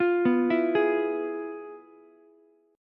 F Minor Major 7th Flat 5th Ukulele; FmM7b5 arpeggiated; JGuitar

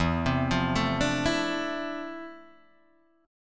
F Major 13th E, guitar chord

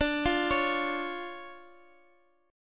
D Minor Major 7th D Guitar Half Step Down

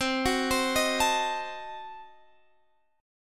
F sharp Diminished 7th C

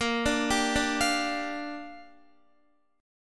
A sharp 6th, Guitar; A#6; arpeggiated; x,13,12,12,14,12

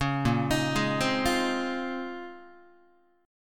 A sharp Minor 11th A sharp down Guitar, chord; arpeggiated; JGuitar

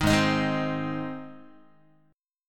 G sharp Major G sharp Guitar, chord; strummed; JGuitar

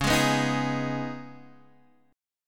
Db 7th Suspended 2nd, Guitar, Half Step Down

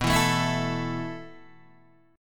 B 7th Suspended 4th B down Guitar DADGAD

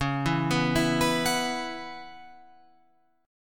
C sharp Minor 11th, Guitar Open F; arpeggiated; C#m11; 13,11,11,11,14,13

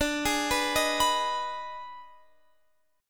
D sharp Major 7th Suspended 4th Sharp 5th D

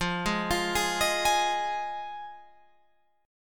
F Minor 7th F Guitar Open F; arpeggiated

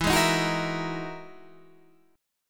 E Major Sharp 11th E, Guitar, Standard; strummed; capo 2; 12,11,13,11,11,12

